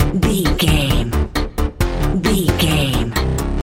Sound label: character selection screen video game loop